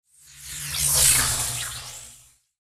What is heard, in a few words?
Sci Fi Energy Beam Pass By Zap Electricity Bolt; Laser